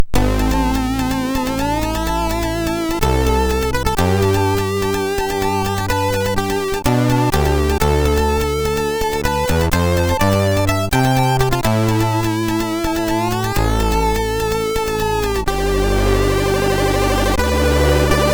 Loading Screen sid MOS6581R3; Naddando; hvsc

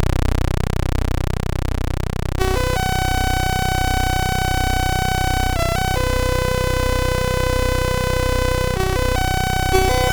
X-Mas, MOS6581R4; Bain David

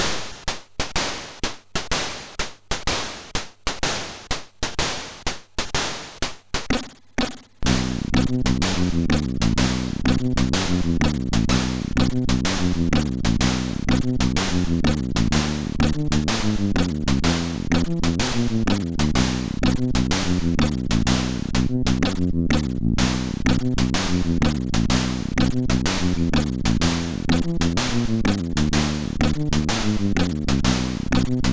Jazz sid MOS6581R4

